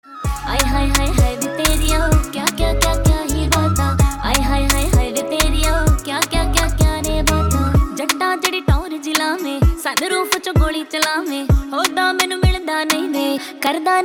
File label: Punjabi Caller Tune